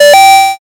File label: coin